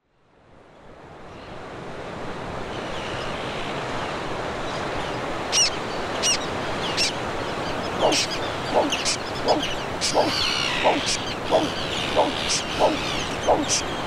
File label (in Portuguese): Som Ilheu Vila Porto; zonas costeiras; ilheu vila porto staMaria